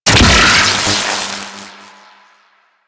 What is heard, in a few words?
Chain Lightning Rifle Shot A; Crp Chain Lightning Rifle